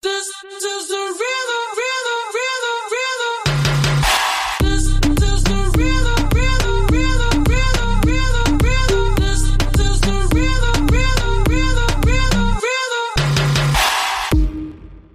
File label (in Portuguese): ritmo